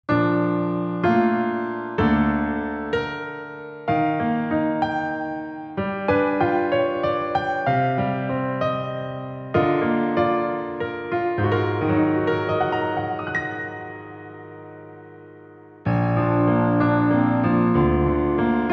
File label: piano